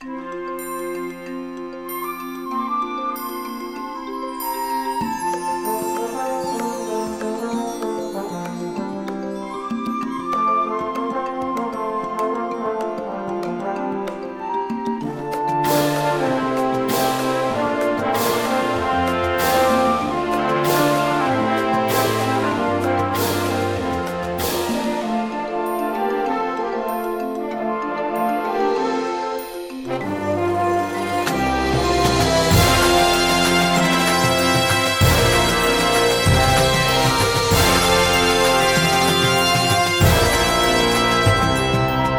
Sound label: cymbals with music, drumline